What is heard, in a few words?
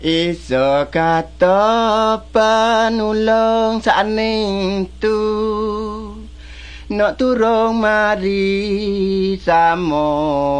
songs malay pattani